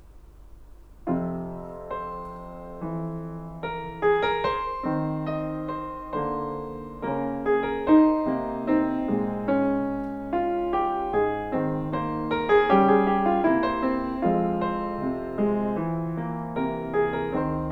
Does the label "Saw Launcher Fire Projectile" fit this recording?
No